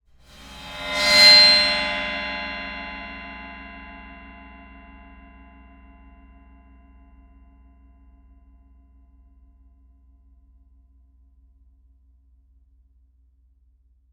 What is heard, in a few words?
Bowed Cymbal, Highs Mids; Soundopolis; Fienup